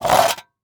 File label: Industry Tool Saw Scraping Wood Fiber Short